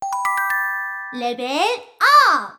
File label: level up